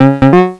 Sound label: Ding Dong; Checkers; Lunar Craters